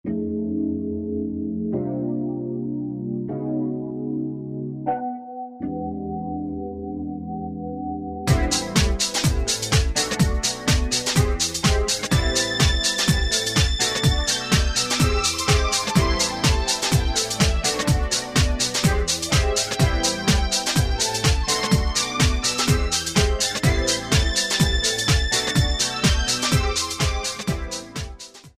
125, House Collection; Beretta; Roland SR-JV80-19 House Collection

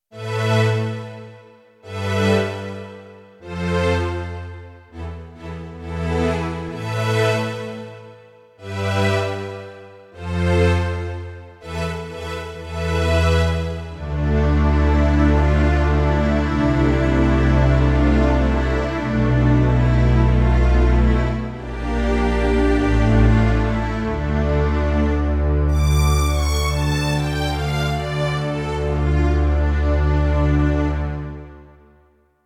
Stirrings, Roland D-70; Factory Performances

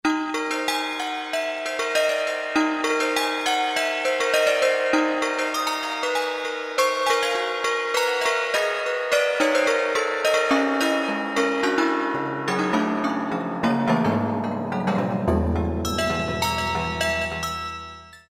Clang, Yamaha TG33; Factory Presets

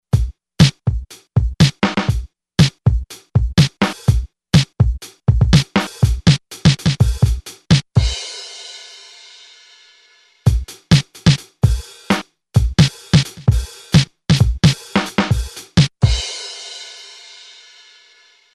ADR-NOISE GATE, Yamaha REX50

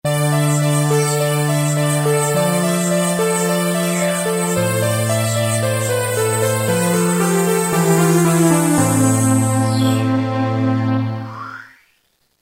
Sweep City; Roland M-DC1

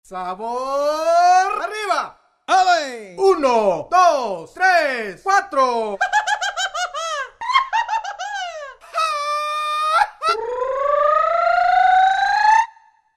Voice; Roland SR-JV80-18 World Collection Latin

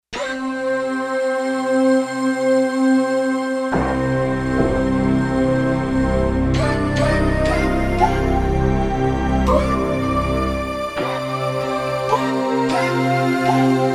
japflute, synth

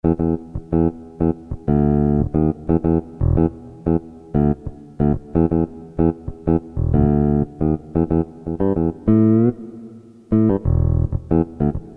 E. BASS, Korg DSS-1

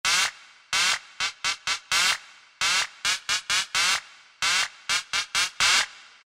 Omen FX; Voices

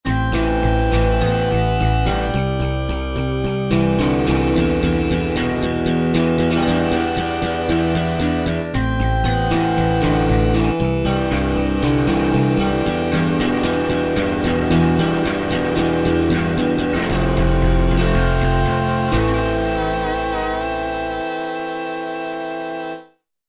Bass Guit, Korg MSC-1S; Korg MSC-1S Variety

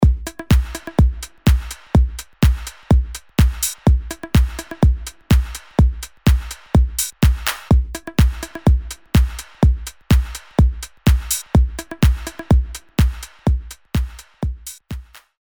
Club Dance Beat; Apple GarageBand 2; Apple Loops

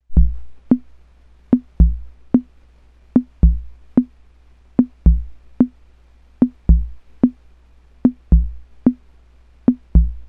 WESTERN, Ace Tone Rhythm Ace FR-2L

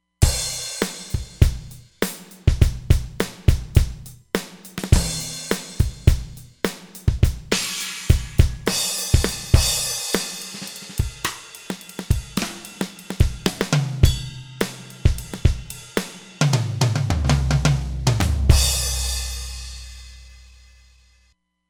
Standard Kit 2, Roland Fantom XR; Drums

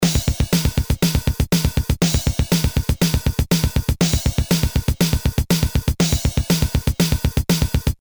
Heavy Metal, Boss DR-220A Preset Patterns

